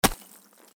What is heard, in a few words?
destroy jelly